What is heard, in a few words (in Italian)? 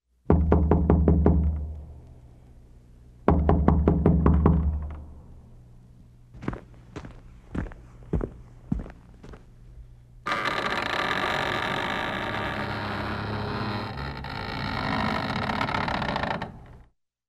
porta che scricchiola e passi